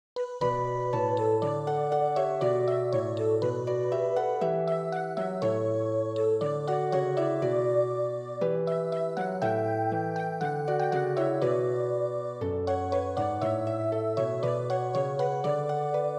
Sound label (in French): harpe; dansa de la primavera